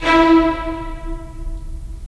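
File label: strings violins, right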